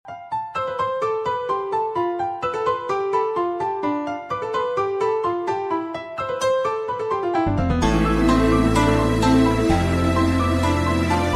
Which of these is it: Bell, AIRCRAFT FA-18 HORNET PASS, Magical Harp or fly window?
Magical Harp